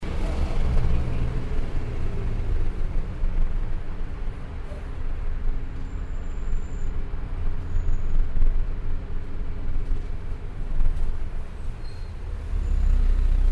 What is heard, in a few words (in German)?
im bus; Das Kleine Field Recordings Festival